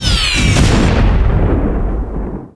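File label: Robot Death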